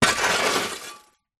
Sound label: foley charcoal movement dig metal shovel in metal fire pit; zapsplat